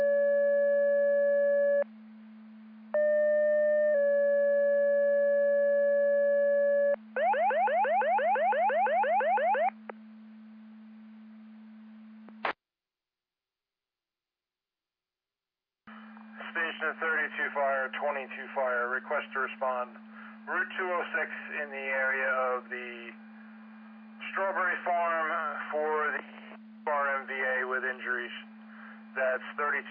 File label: Siren; Andover Twp Boro